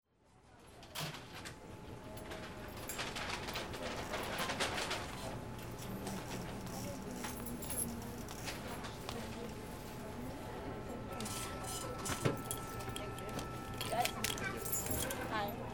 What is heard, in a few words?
AMBIENCE